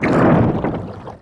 Underwater Switch; Playable Tutorial Level

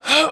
Push; Lara; Playable Tutorial Level

